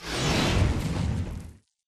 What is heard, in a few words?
atlas dash slide; Titanfall 2